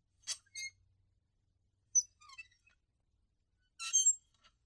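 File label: creaking of lantern; Звук раскачивающегося фонаря от ветра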